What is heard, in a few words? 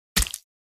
Knife or dagger slide and stab